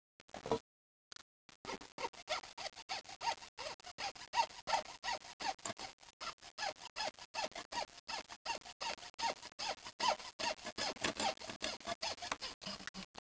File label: saw; toyshop